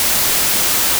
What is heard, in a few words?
noise